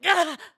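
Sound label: Hurt Lava, voice; Link; BOTW; Breath of the Wild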